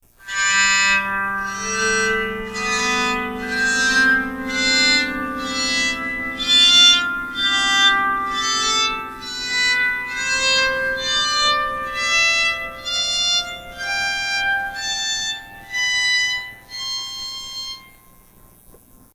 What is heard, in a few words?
Nickel Scale, psaltery